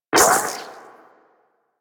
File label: Shot, Auto Crossbow; Warframe; Tenno; Prime Ballistica